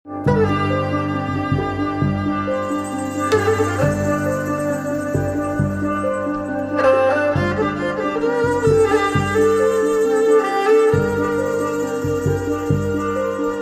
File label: erhu; due erhu